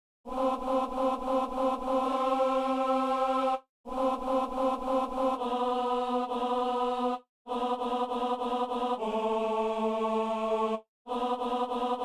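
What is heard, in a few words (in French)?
la première en chemin, alto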